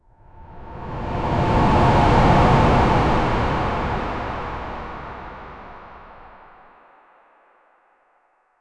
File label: soft airy whoosh with a; high end